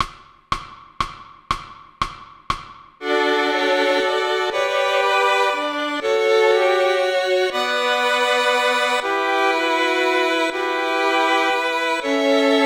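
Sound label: Violin Melody Chord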